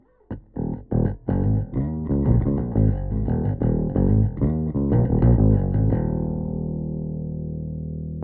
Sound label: bass; Valves; Fries